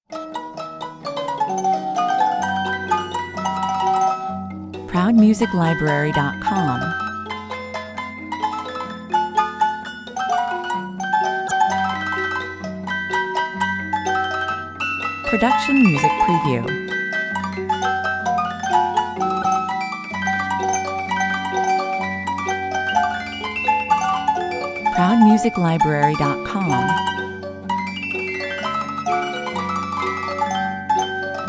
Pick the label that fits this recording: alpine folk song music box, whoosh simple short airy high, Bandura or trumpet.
alpine folk song music box